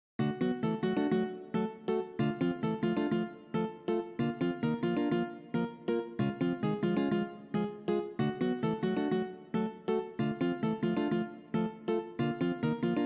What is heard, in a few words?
cont rhythm example